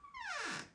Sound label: Door creak